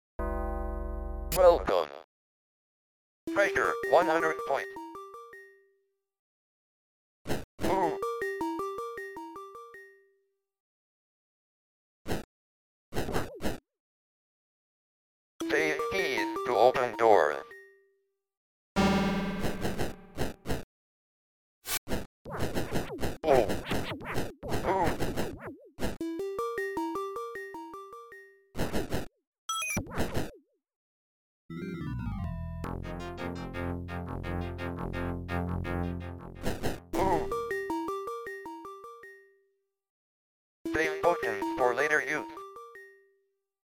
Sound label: sword